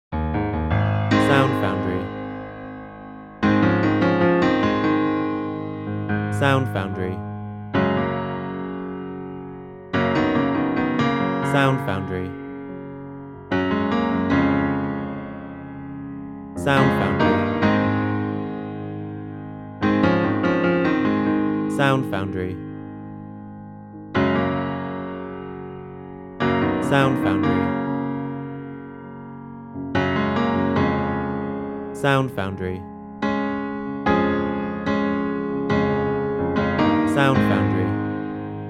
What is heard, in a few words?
Piano At Dawn